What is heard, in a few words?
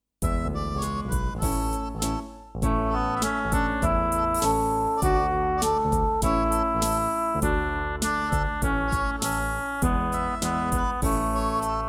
Melody